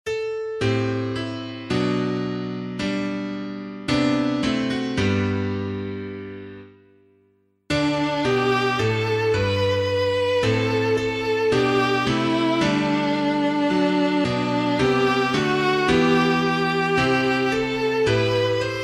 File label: piano, hymn; An Upper Room Did Our Lord Prepare; Green; O WALY WALY